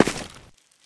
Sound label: footsteps, dirt; player